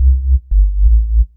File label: 175 D&B Synthbass Cm; Dance DJ